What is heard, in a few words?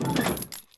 suspension, car; Cars; MATER; XBOX